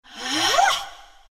pain; zh; Dota 2

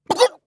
Warrior Death; Realm Royale

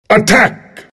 attack; dragon knight

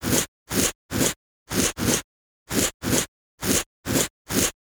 idle scratch; Dota 2; Ogre Magi

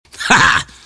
laugh; riki

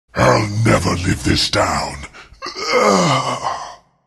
husk death; huskar

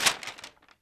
item recipe pickup shop; Dota 2

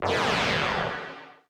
effect cancel; feheroes

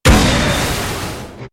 Heat-Seeking Missile; Tinker

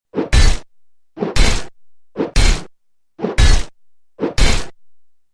Clockwerk attack

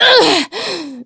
VO Metal Warden Heavy Wound; battlerite

